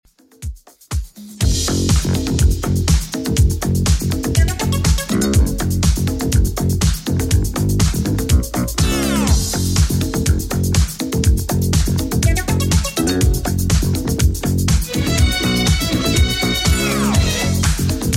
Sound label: 70s Redrum 122bpm